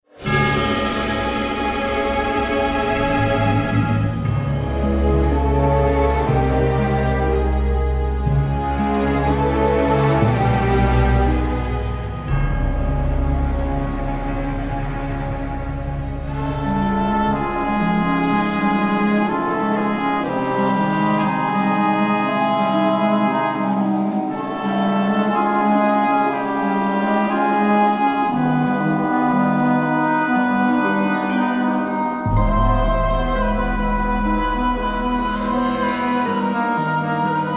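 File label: Woods Dark, music; Drustvar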